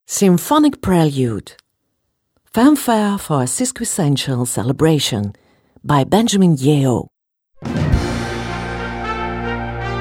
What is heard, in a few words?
Symphonic Prelude, wind band